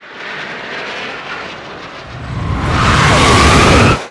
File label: incoming nuke; Explosions